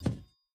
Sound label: Sword Drop; Game rip; MiSide